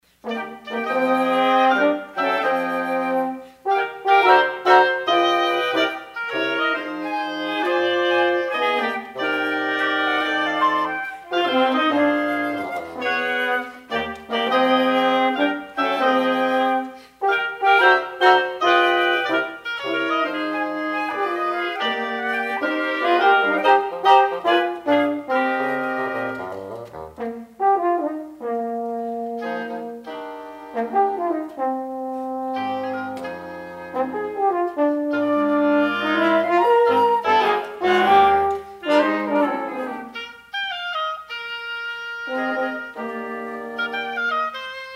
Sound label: jingle bells, wind quintet